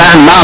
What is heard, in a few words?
bam bam loud